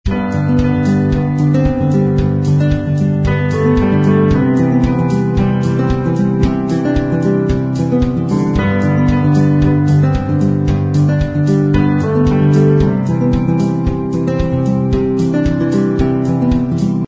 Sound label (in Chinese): Verse, 这首以原声吉他和钢琴为特色的歌曲充满了感伤、圆润和温暖的光芒。; 音乐素材 夏日温馨套装 Warm Summer Kit